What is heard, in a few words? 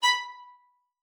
B5, VIOLIN SPICC; Xsample VOL-5